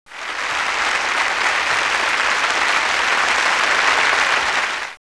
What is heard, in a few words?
applause